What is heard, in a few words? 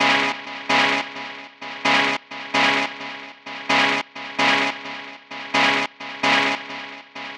130 C synth, Synth Loops, Trance; Trance Explosion Vol1